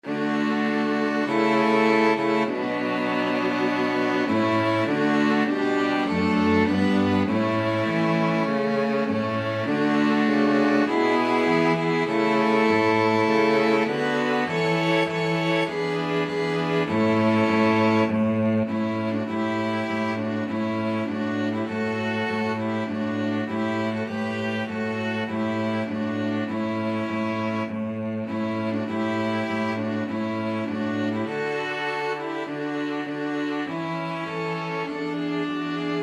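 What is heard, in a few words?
canadian national anthem, string quartet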